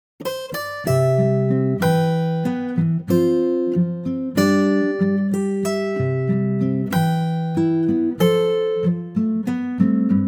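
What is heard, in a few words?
C to D Acoustic Guitar; key - C to D - vocal range - G to E (optional A falsetto after the bridge)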